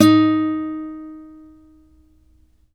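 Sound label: acoustic finger D#3, guitar; East West; Steve Stevens Guitar Samples Collection; ACOUSTIC FNG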